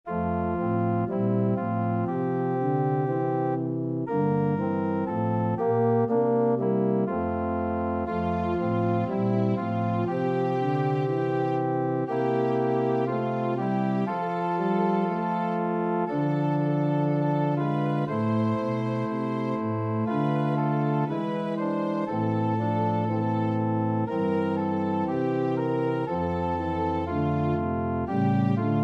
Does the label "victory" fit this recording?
No